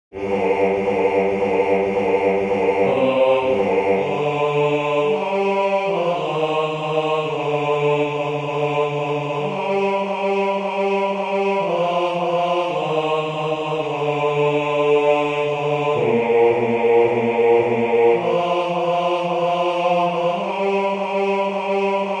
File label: bass; Three Ravens